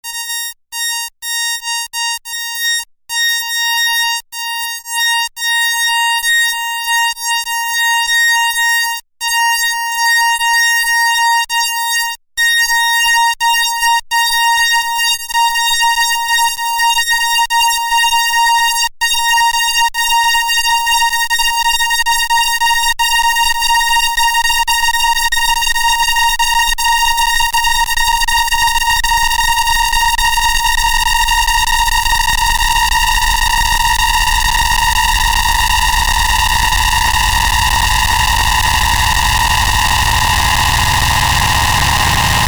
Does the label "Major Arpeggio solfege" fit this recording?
No